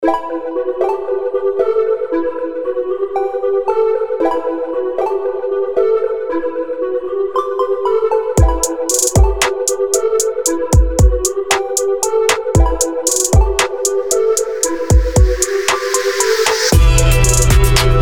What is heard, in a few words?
synthesized